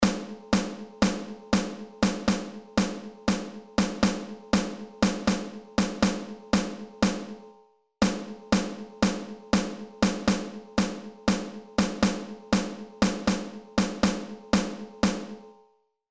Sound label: Rhythms Snare Drum